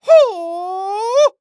Human Male Karate Yell Moan Yell

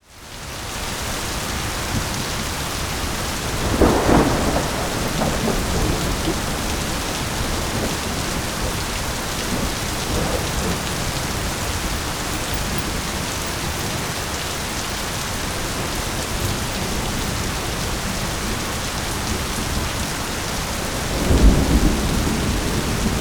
Weather Ambience Heavy Rain Thunderstorm Thunder

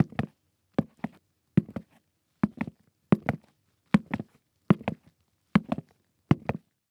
Foley Footstep Golf Cleats Walking On Cement; Foley Footsteps

